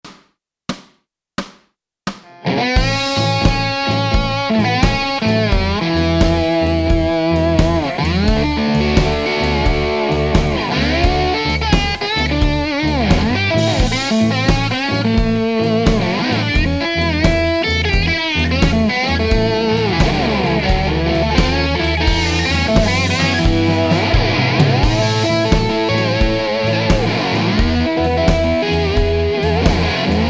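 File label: Slide, blues slide solos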